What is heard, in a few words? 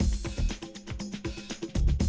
120, Classic Drum Loops